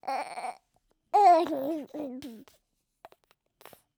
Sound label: arm wiggle